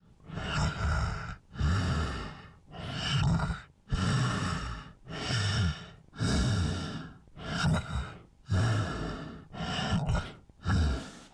snore loop; bone thumper

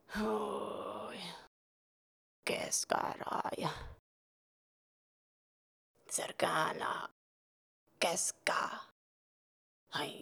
idle loop

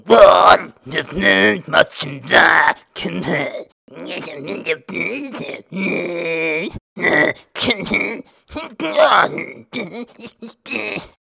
Roachtender sing; Hollow Knight: Silksong

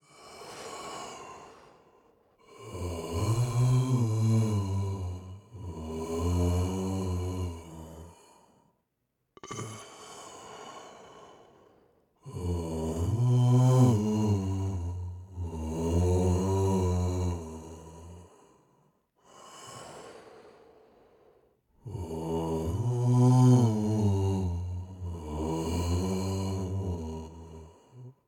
melody singing idle; a conductor

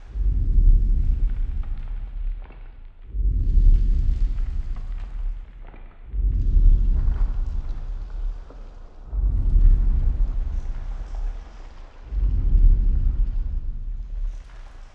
silk cradle beat distant loop slower

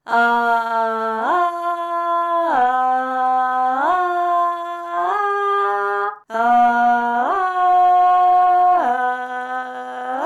Architect sing; Hollow Knight Silksong

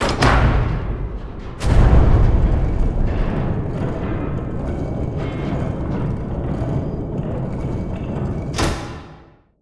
bellshrine switch activate; Hollow Knight: Silksong

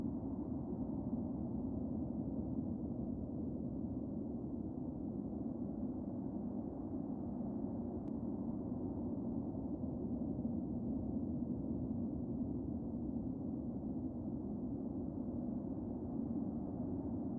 cave atmos; misc 3

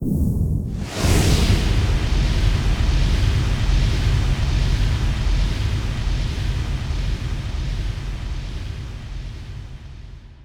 takeoff; gamerip; Hollow Knight: Silksong; mr mush

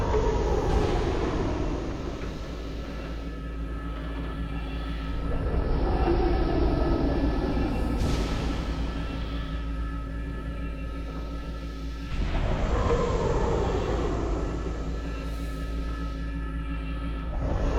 machine breathing loop

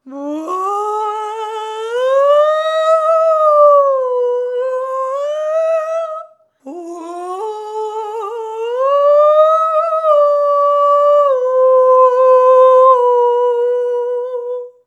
BM sing

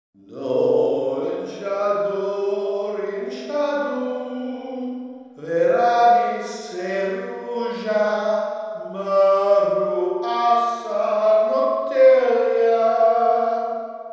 sing; CogD sing 01

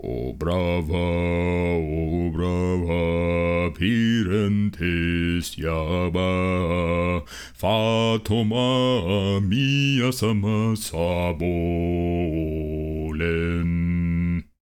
Male NPC Song; Hollow Knight: Silksong

